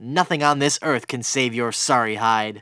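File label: female attack; NWNDS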